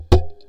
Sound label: Djembe cheap mic session